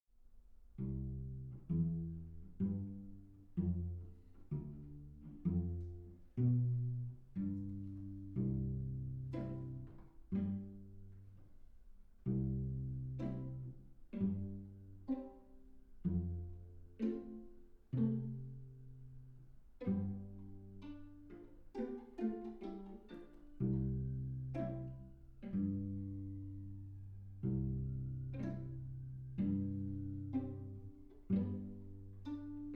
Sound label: without Ukulele